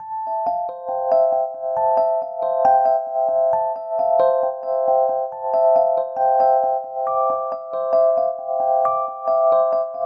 Ostinato Cinematic Dreamy C Major6 68 bpm, Electric Keyboards; MIDI Clips; Ableton; Factory Packs